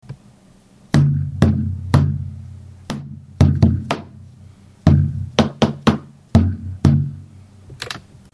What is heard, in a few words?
b-ball taiko